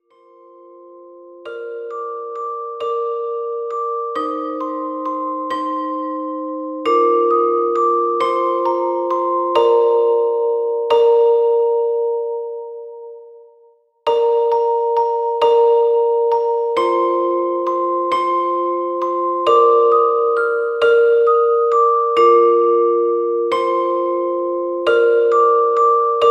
Berceuse Pour Bébé, Noctambule Rêveur

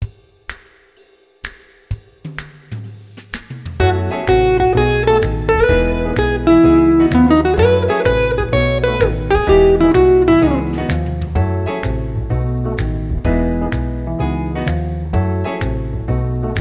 Beau nez rouge (Instrumental)

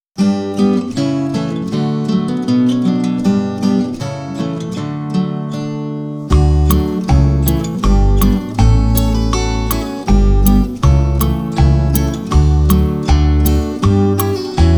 La chorale des oiseaux (Instrumental)

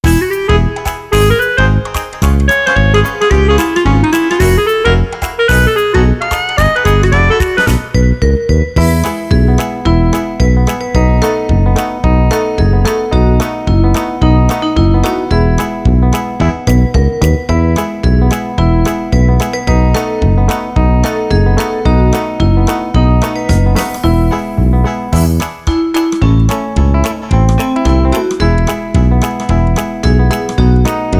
Version karaoké

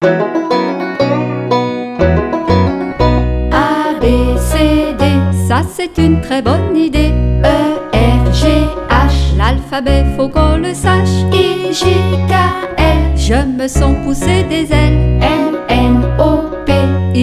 Je connais mon alphabet, Chanson

